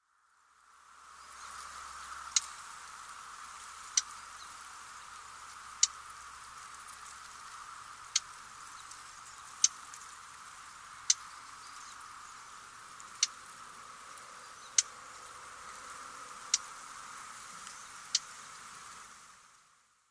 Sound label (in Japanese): yabuyoshikiri nishinihon